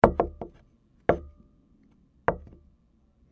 dish placing

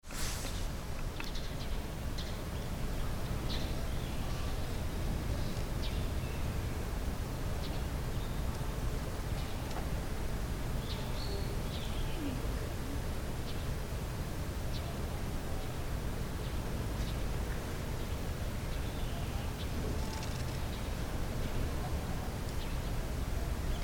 city bird motorbike

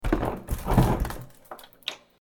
refrigerator

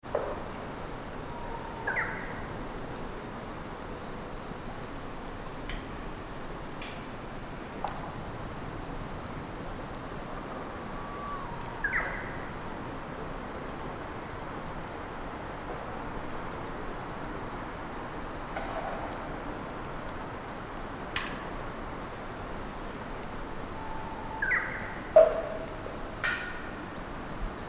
Japanese nightingale

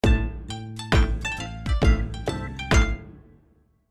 JINGLE Japan

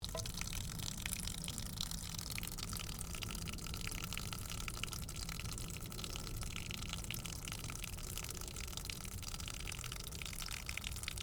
Shrine Chienomizu; Iimori

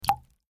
water drop

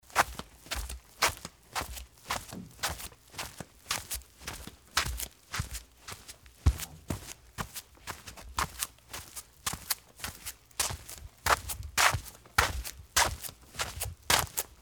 footstep waraji

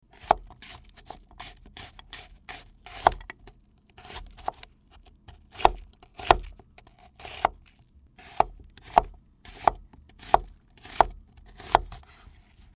onion cutting

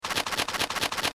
gasagoso

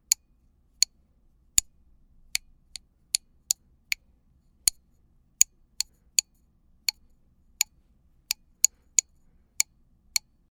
wood hit

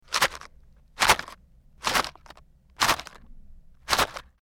gasa

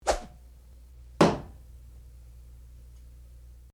japan arrow

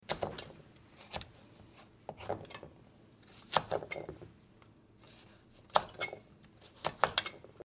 cut

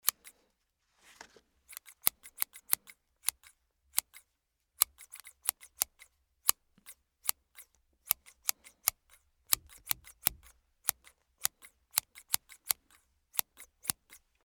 scissors

